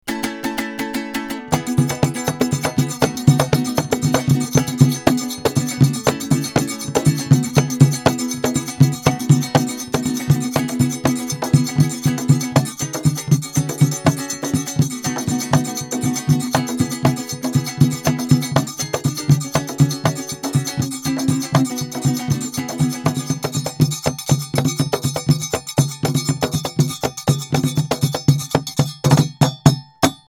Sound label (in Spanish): Tambor Coriano, ringtone; Vtelca V2800